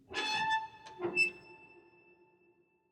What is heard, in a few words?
torture cage open; ambience room